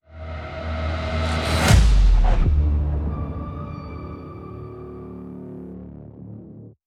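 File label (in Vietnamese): âm thanh tiêu đề kết thúc phim kinh dị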